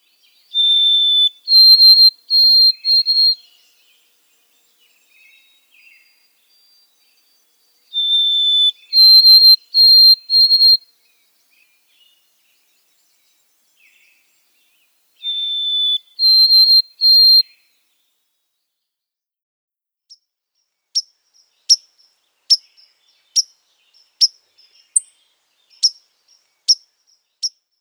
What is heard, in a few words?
white-throated sparrow